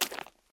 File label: mudstep; Original TK Sounds